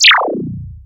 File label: SYN FX; X-Static Goldmine 1